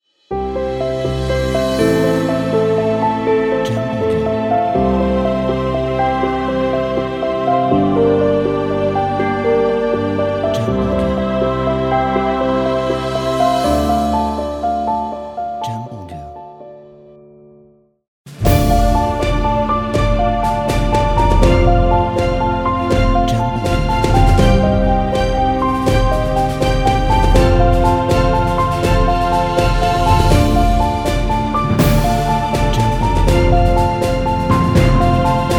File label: Cinematic Intro; Cinematic Intro Pack